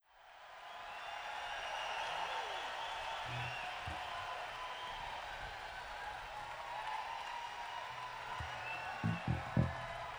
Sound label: Tuning